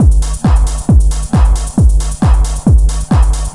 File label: Drums Techno